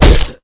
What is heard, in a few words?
Damage